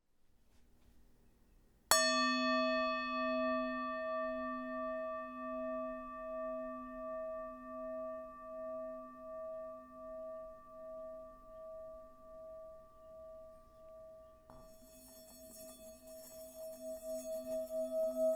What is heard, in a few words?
Tibetan singing bowl